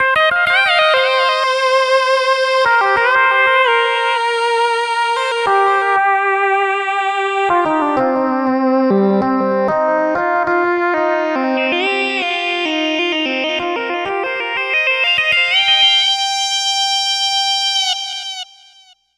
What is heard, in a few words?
80s Lead; Riffs & Solos; Dr. Fink's Funk Factory